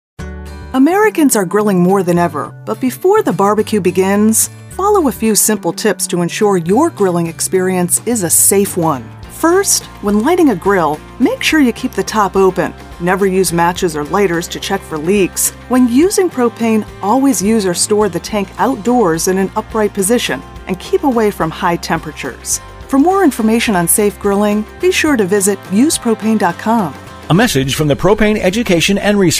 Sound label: perc